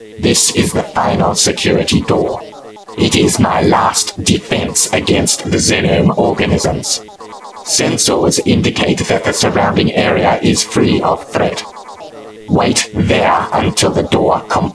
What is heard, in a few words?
final door, ambience